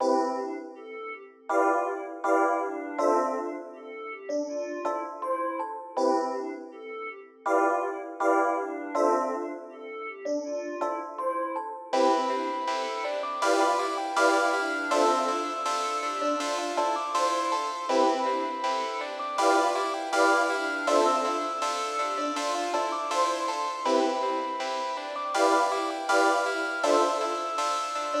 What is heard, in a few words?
Melody; Retro Kid